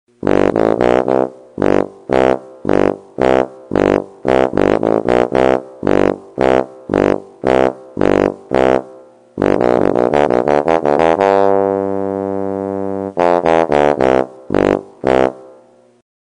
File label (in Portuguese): Tuba